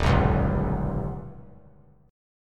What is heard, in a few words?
D sharp Augmented 7th D sharp, 3C,3F,3A,3D; strummed; 3,6,4,5; JGuitar